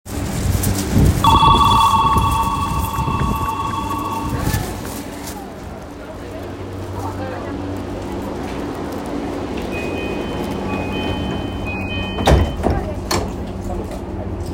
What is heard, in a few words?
train sounds; 東急田園都市線二子玉川北